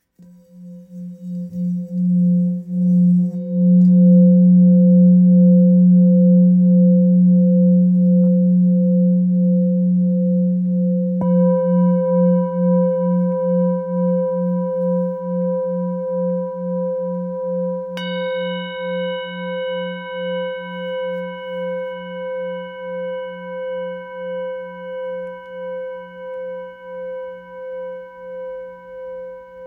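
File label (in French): Bol therapeute do, bols chantants tibétains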